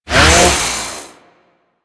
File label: Melee Swing; Attacks; Warframe; Grineer Ghouls